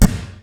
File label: drum; crakbone